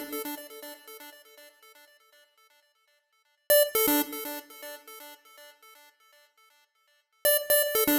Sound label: Mono Synth, 120bpm D; Zero-G; Nu Disco; Happy People